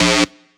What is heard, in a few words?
synth rave speak C; M8